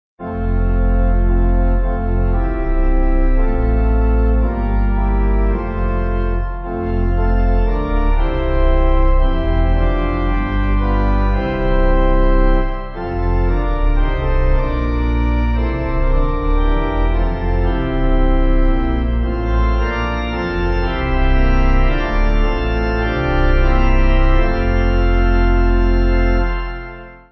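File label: Pipe, Pipe Organ backing; Among Us Our Beloved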